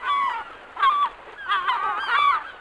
gull; Mafie 1

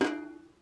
drop; Mafie 1

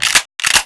shotgun; Mafie 1

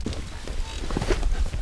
car sit; Mafie 1

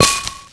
bush; Mafie 1

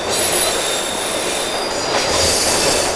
tram; Mafie 1

